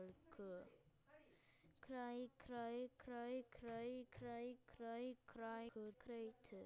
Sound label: cry